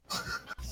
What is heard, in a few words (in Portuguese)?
risada